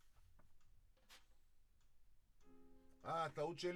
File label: Drums